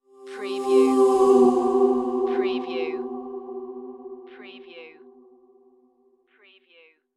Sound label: Swell Vox